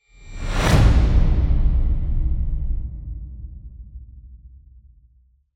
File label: Swish